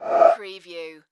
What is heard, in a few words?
Multimedia Roll Over; sound-effects-bible